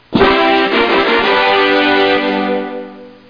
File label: intro music; HOLIDAY